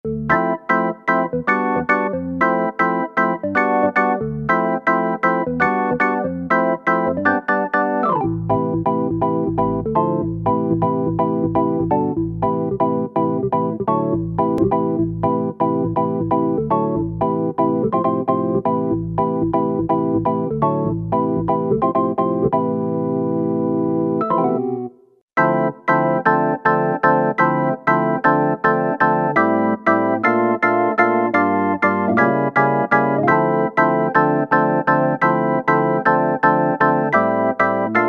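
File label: House Organ, Alesis Z2 EuroDance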